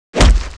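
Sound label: damage, Monster; gangsi; C9; 강시할로윈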